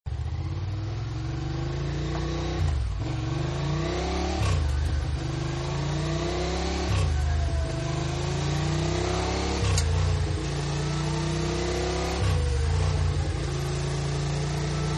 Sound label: Ducati Takes Off, Motorcycle; Motorcycles; Vehicles